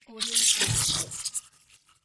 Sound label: scifi biomechnical movement sound